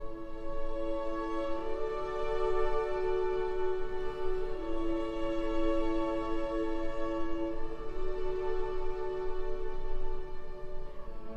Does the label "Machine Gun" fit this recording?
No